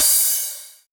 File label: HiHat, closed hats